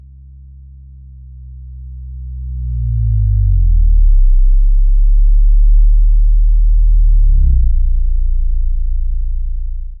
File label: a luxury car smooth rev